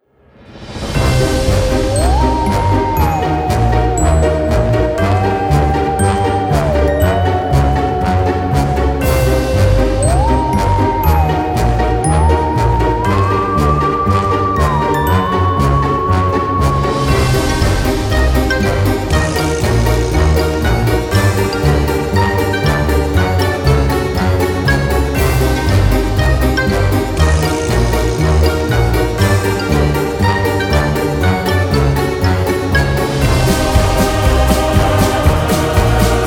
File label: Halloween, ringtone; MIUI14 and HyperOS 1.0